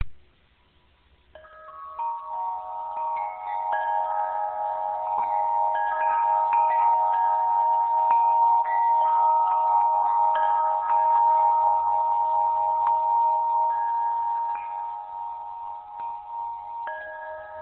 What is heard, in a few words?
Canterbury Bells; mayo purple